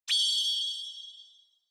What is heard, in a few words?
Onoma Flash High